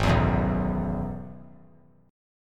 Ab Minor 7th Sharp 5th Ab, down, 3C, 3F, 3A, 3D; strummed; JGuitar

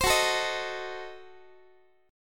F sharp Diminished 7th, Guitar; F#dim7 strummed; x,x,15,16,13,14; 4A,4E,5A,5D,5G#,6C#; chord shape

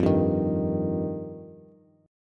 F 7th Suspended 2nd, Bass; strummed; chord; F7sus2; JGuitar

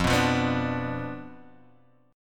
B Diminished F, guitar

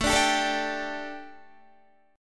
A 7th Suspended 4th, A, down, Guitar

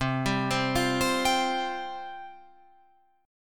C Suspended 4th C, Guitar Open F; Csus4 arpeggiated; 12,14,12,12,15,14

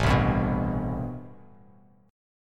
A-Minor 9th A Bass Standard; strummed; JGuitar

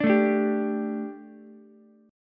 G Suspended 2nd G, down, Ukulele; Gsus2 strummed; JGuitar

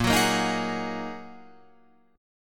Bb 7th Suspended 4th Sharp 5th Bb Guitar Open F; Bb7sus4#5 strummed; 10,13,10,10,11,13

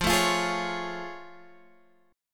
A Augmented Major 7th F

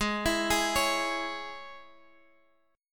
G sharp Suspended 4th Sharp 5th, Guitar, Drop D; x,11,14,13,14,x

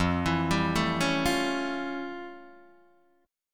F Augmented Major 9th, Guitar, Open D

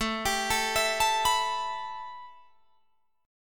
A 7th Suspended 2nd Guitar Drop D

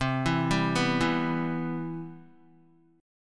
C Major 7th, Guitar; arpeggiated; JGuitar

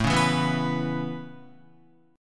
A Major Add 9th A Guitar, chord; Aadd9 strummed; JGuitar

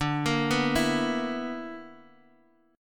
B Minor Major 7th Double Flat 5th, chord

